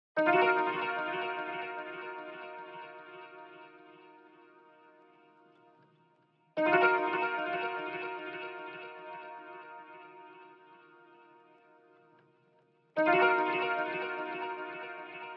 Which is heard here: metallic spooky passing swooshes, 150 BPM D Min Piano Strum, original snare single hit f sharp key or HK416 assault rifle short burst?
150 BPM D Min Piano Strum